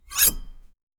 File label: asmr sound of a sharp; cutting